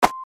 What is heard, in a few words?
match